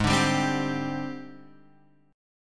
Ab Major 7th Flat 5th Ab, down, Guitar, Open F; strummed; JGuitar

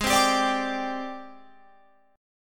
G sharp Major Sharp 11th, Guitar Open F; G#M#11; 20,19,19,21,22,21; strummed

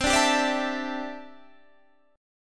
D 7th Suspended 2nd Suspended 4th C

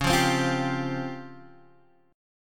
C sharp Major Sharp 11th, Guitar, Half Step Down; chord

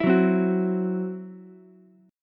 C Major Flat 5th, Ukulele; strummed

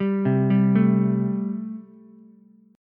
A-Minor 7th A Ukulele; Am7 arpeggiated; JGuitar

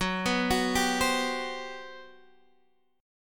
G Major 11th Gb

